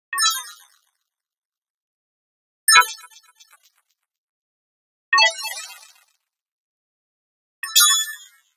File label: Arcade Click Negative